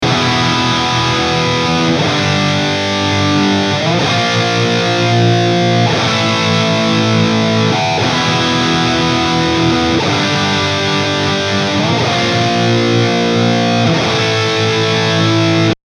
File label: BRIDGE, eclipse active, 5150